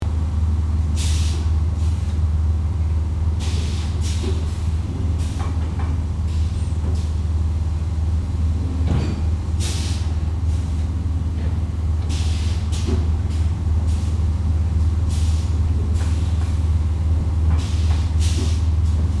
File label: Warehouse factory machine room ambience loop